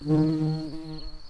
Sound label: bumblebees, Pascuorum queens